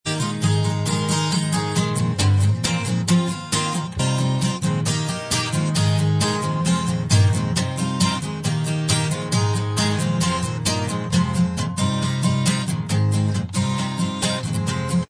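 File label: acoustic version, instrumental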